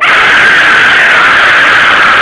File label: AAAAAAAAAAA